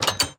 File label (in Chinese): 铁门 打开声